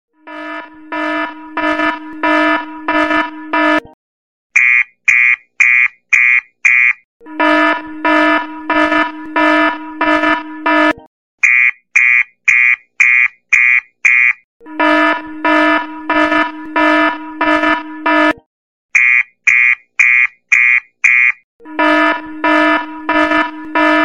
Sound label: alarm samsung